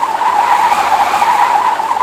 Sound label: Car - Skid; Extreme Demolition; Android, iOS